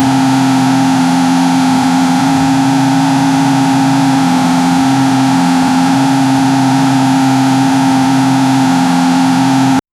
loopable flat six, racing; natural

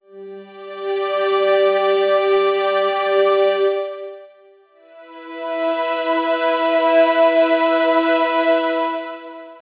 synth strings one note

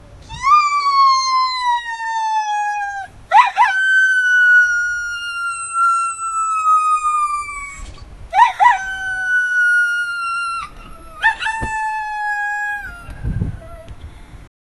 dog whining; crime

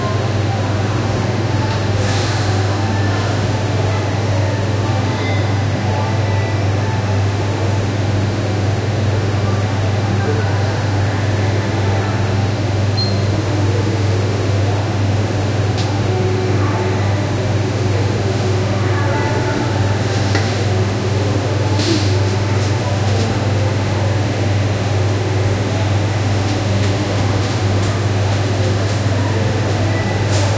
retail store ambience

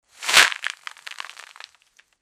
shaking tremble and then, drop

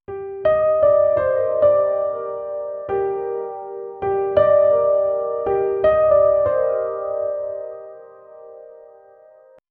warm orchestral or soft, piano

